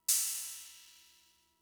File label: Cymbal, Fricke MFB-522, Drum Machines